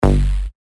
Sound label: bass shot G; Rash; revealed bass shots vol 4